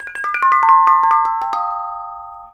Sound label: KONGWONG